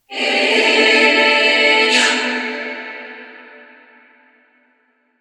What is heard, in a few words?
Confronting choir